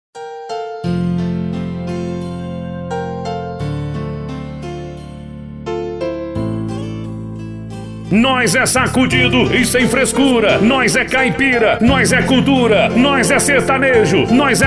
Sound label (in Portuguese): sertanejo classico